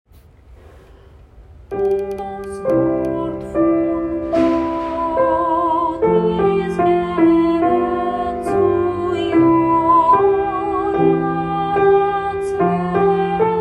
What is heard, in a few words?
Alto; Chapter 3 1-16; Shirei Chesed Fall 2025 Recordings